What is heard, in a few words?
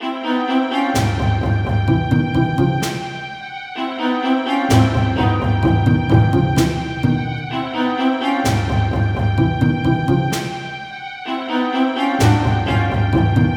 suspenseful; Dark Deity